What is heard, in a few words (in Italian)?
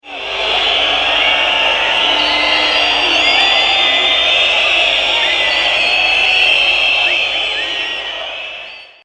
fischi; stadium